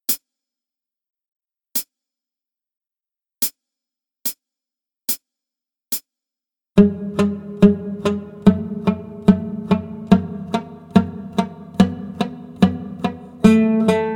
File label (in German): Übung für die linke Hand, Gitarre; Play Guitar Gitarrenschule 2